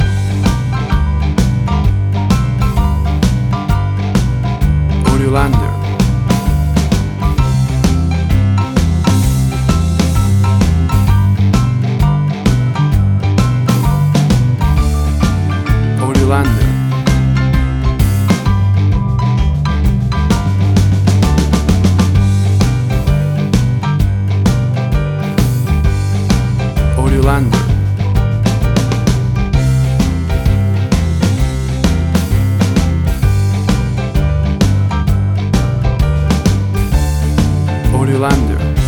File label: Boogie Woogie Bar Blues